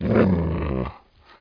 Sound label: dog growl; Frogger 1997